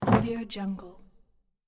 old hemp rope drop

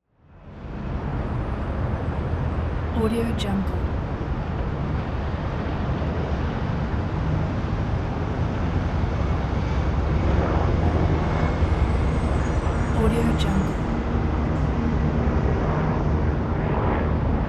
new york city 25th floor traffic; prosoundfx

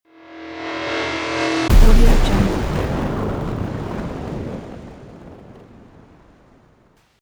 machine thunder explosion transition

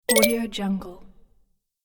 get coin game sound

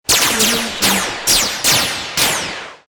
photon laser gun multiple shots

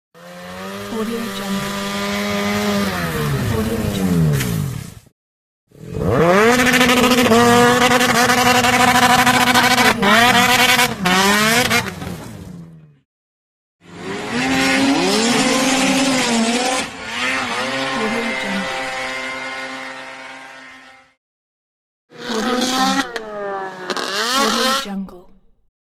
snowmobile

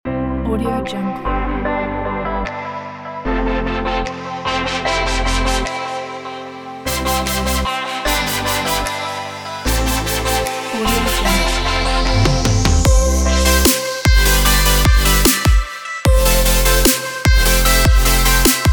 future bass advertisement; penguinmusic

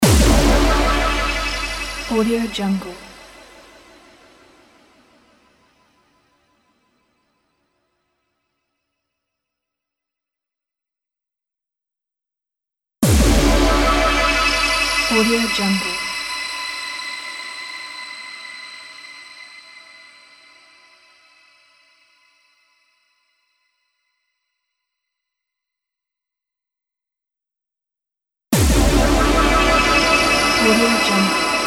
intro impact

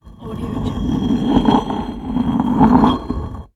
stone grind circular

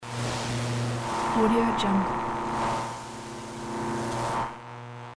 static interference; javierpaniagua

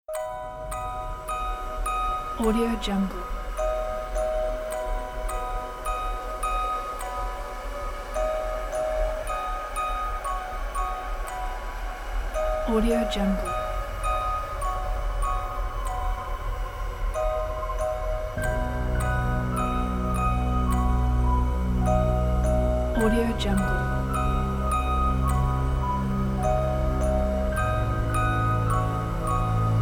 creepy spooky

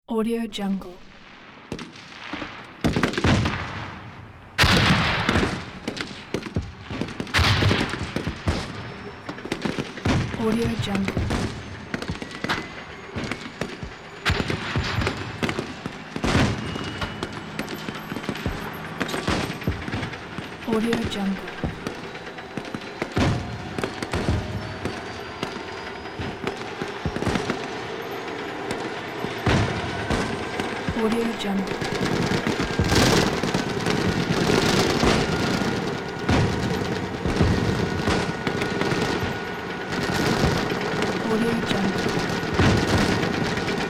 battlefield

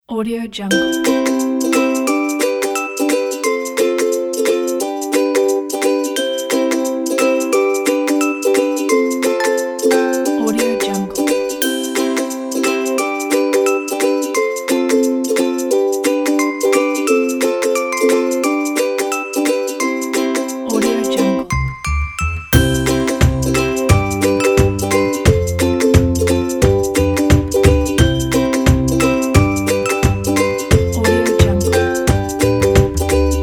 happy uplifting

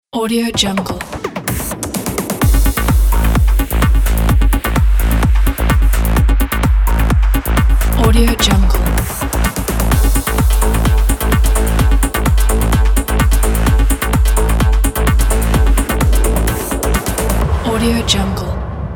video ident; frontmusic

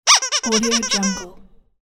squeaky toy

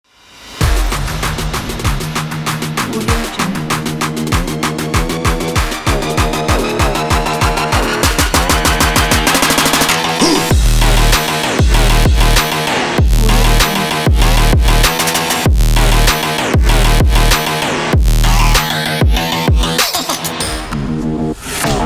trap

